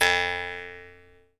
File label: jaw harp, Pitched Percussn, Jaw Harps